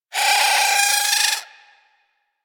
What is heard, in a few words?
Vocal Captured Idle; Warframe; Hunting Critters; Avichaea